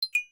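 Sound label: button click; flying ball2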